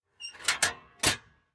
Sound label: Door Metalbox Close Noreverb; this is the zodiac speaking 2020 gamerip switch ps4 xbox one pc macos